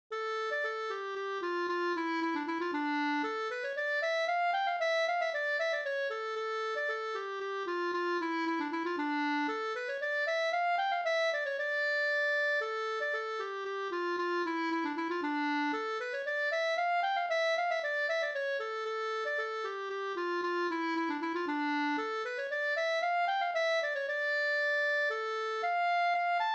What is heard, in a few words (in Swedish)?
polska